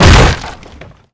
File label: door wood fence post kick; Doors; ModernWarfare